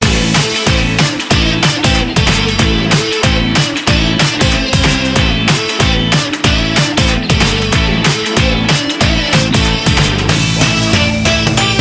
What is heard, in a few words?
Rock summer